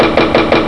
Gun; Macworld (1997-09); Games World; Shareware Games; Arcade; SkyDive 1.0

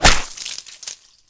schwib; Shadow Man

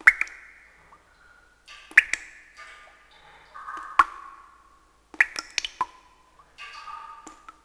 drip; Shadowman; ambient

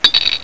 coin; Wyatt Earp's Old West

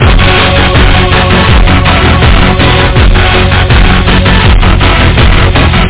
CHORD

